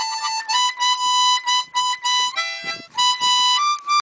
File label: Harmonica